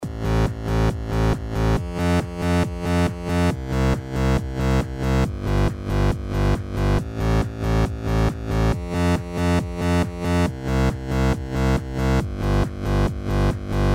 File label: Control Room